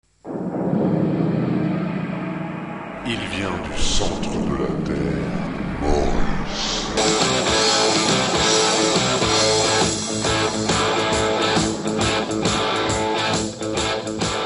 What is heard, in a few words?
jingle